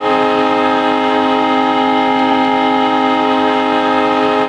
Long, Train Horns; Nathan M5; PFx Brick

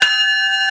E Bell, trains, bells

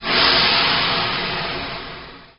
Air Release, Transport Trains; PFx Brick

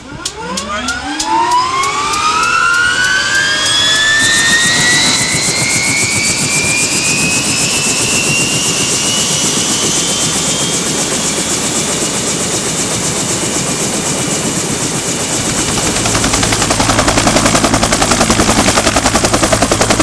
Heli Startup Short; Transport-AirMarine; PFx Brick

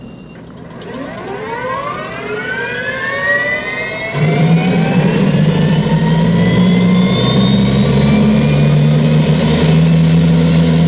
Heli Startup Long; Transport-AirMarine; PFx Brick

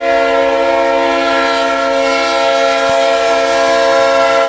Leslie RS3L Long, Trains Horns; PFx Brick

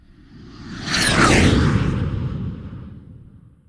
Jet Fly By; Transport-AirMarine; PFx Brick

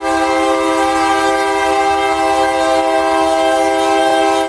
Nathan K3LA Long, Trains Horns; PFx Brick

